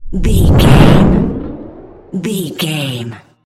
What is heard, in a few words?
sci-fi vehicle thrusters pass